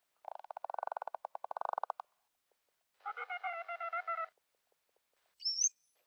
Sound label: voice registers bottlenose dolphin